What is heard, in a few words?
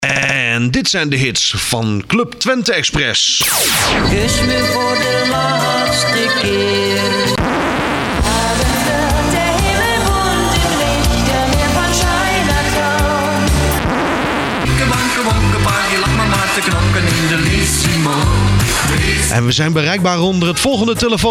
Jingle; Audio Fragmenten